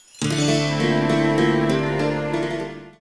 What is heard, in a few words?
Jingle Lost; 7 Wonders II; NDS gamerip